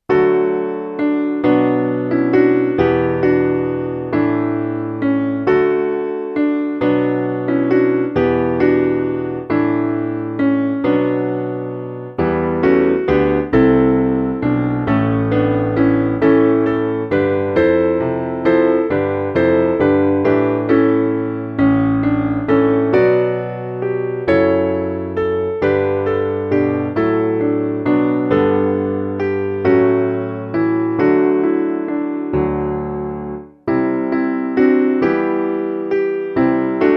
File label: Holy piano, choir